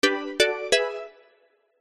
multimedia game sound advance award nylon plucked synth